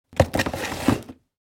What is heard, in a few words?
Take an object out of a cardboard box